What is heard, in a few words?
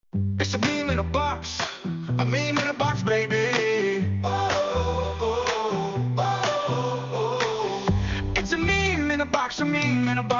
Meme Beat